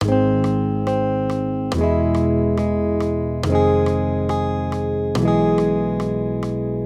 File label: maj triads